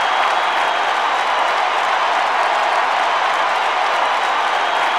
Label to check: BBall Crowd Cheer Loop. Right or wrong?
Right